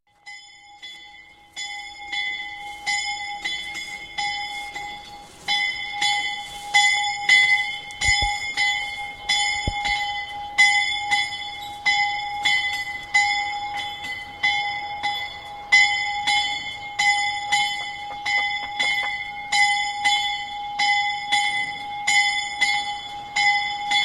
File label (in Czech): zvon; horka